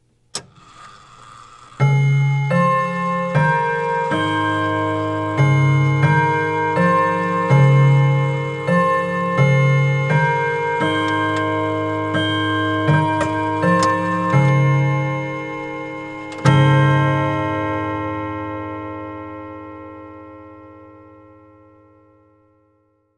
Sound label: Mech Westminster